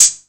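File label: 808 open hi hat; Wizoo - Platinum 24 Electronic; 808 DRIVE P1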